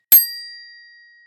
Fahrradklingel Classic XL

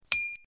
Fahrradklingel Kaffeetasse mit Rosen

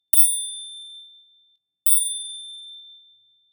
action messing

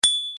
Fahrradklingel Biene

